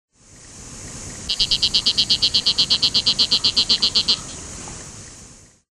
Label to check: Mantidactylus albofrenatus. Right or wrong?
Right